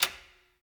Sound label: Secret Latch; Slave Office